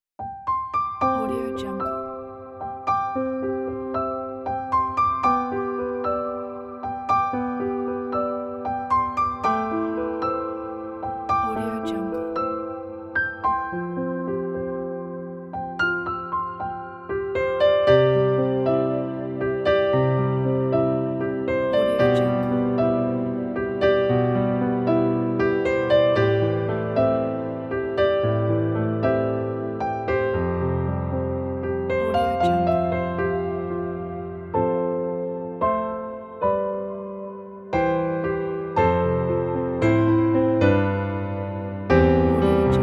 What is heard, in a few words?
hope solo piano